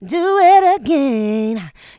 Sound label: Female Vocals; Houseworx